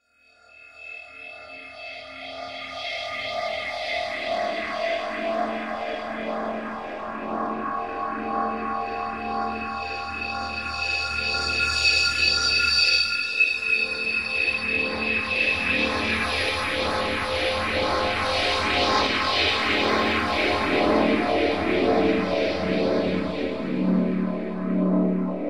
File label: Horror Drone Bed Swells Eerie; Scary Tones; Sound Effects:Horror